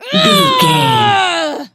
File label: voice female a battle shout